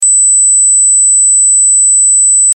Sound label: buzz